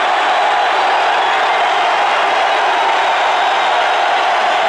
BBall Crowd Cheer Loop; Crowds

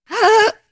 Gasping Woman; horror sounds; Hollywood Edge

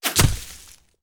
Bullet Impact; Sound Ideas; Guns

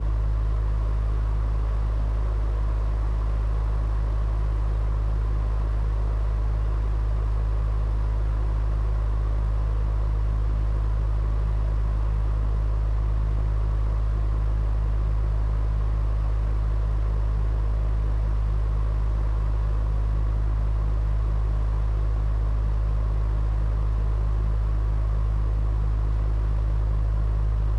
fan; NS Studios - ultra sound collection

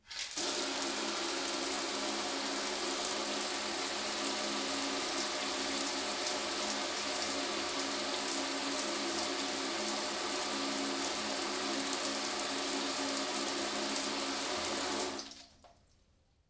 bathtub; liquid; NS Studios - ultra sound collection

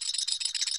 Zip Line Wire Loop; SFX Kit

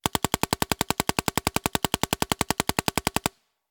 Paintball Gun; Sound Ideas; Guns

